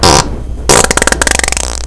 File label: fart; T&P Fart Central